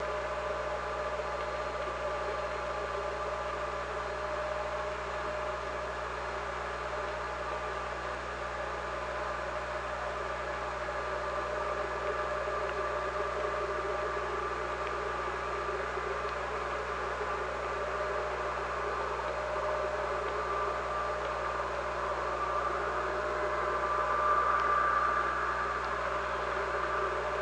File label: OrcaLab